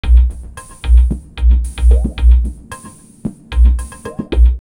112, drumloops, DrumMachines; X-Static Goldmine

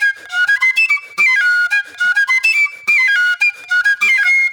Eastern Flute; Sonic Foundry (Sony Creative Software); Manhattan Flutes; Garage Funk

